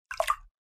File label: Scoop water